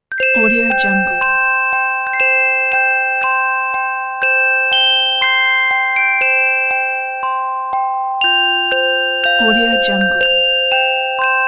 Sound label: jingle bells logo bells